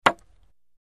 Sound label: Wood Staff Hits Mediu, spears & staffs